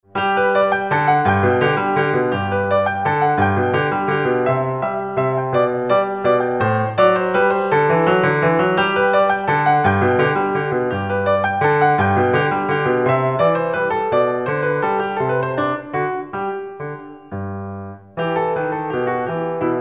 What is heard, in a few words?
g major minuet